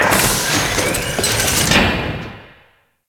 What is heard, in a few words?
Space Port Cargo Door Open; Venus Open World; Objective Rooms